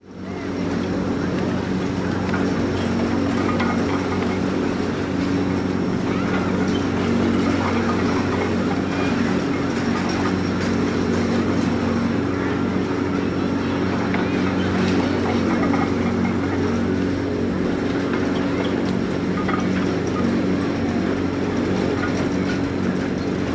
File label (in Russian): zvuki snouborda